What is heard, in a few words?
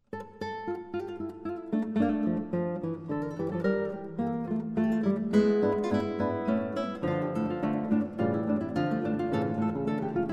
guitar duo; Fandango G.448 No . 3